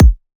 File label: Kick Trap